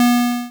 Pinball Poweron Tone

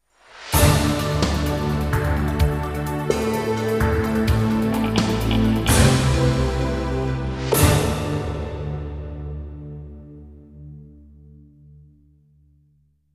jingles; 90 DDD Jingles

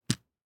DICE on Felt, Drop, Standard, Five Dice; Samples; ArticulatedSounds; DICE Sfx Party (Extended)

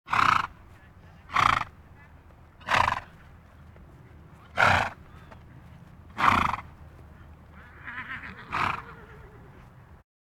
Animal effects snorts grunts farm ambience

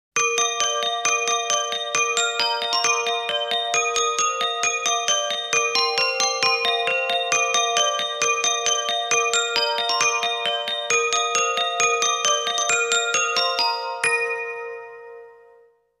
Christmas Jingles

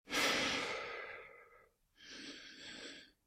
Breathe Slow heavy breath; Eden; RadwinIsland

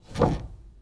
Foosball Goalie Spin

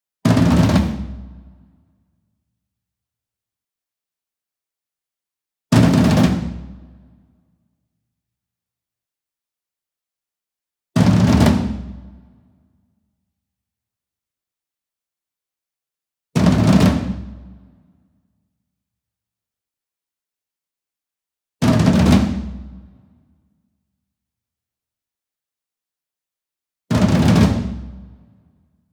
ENSEMBLE DRUM Concert Toms Roll Medium; boom library; Cinematic Strikes Construction Kit

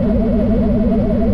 Scifi Hover Drone Loop; SFX Kit; Sci-Fi Loops

